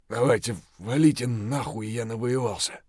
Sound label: hurt near death, voice; Escape from Tarkov